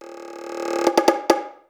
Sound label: Fill 140 BPM